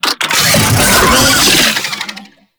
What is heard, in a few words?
Summon Vocal; Warframe; Uriel; Catenach